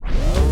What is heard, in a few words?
spinup; OK K.O. Lets Play Heroes; mus rambo